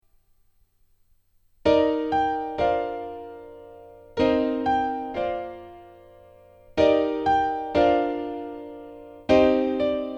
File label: DP7U Piano